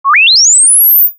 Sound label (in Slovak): hvizd